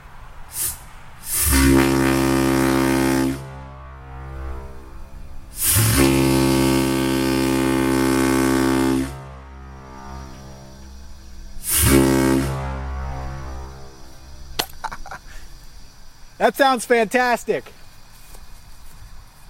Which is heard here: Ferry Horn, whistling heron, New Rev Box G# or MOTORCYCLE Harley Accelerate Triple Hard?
Ferry Horn